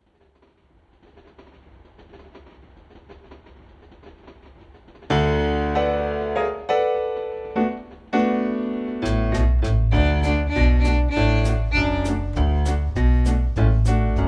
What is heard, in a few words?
Rhythm Train